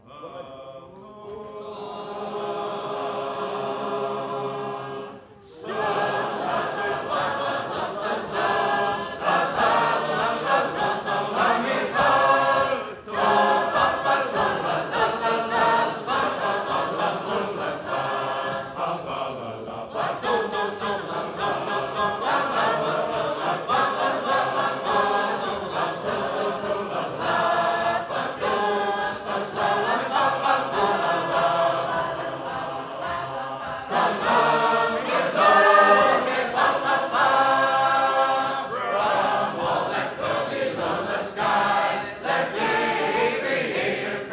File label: Schenectady, sacred harp